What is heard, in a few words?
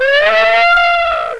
scream; duke nukem total meltdown